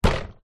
impacts plastic seat hit; zapsplat

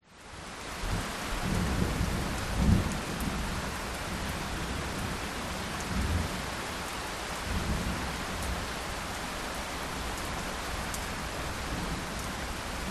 nature thunderstorm distant moving away med rain; zapsplat

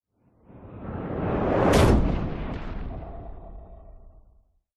science fiction explosion; zapsplat

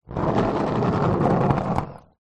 sport skateboard plastic short skate close by

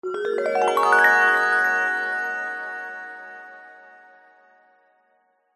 magic glissando ascending bright warm twinkle; fantasy; zapsplat

